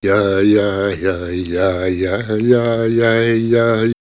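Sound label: Ethno Vox Joik; Jojk; X-Static Goldmine; vox mix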